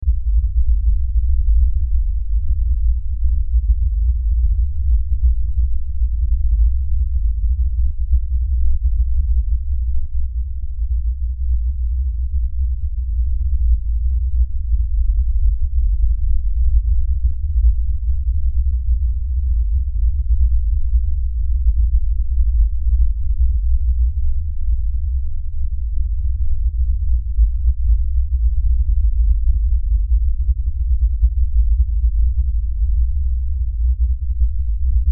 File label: Lfe Drone Low Bass Rumble; Drones; Blastwave; Sound Effects:Sound Design